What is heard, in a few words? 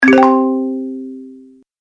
Deep Bonus Win, Video Game Sound Effects